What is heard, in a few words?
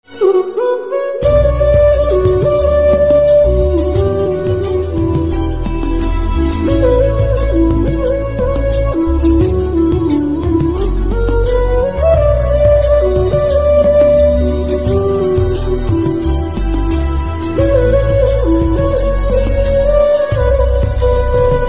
Organ Ringtone